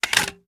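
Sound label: reload mag out, shotgun; gmod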